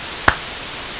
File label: clap noise; claps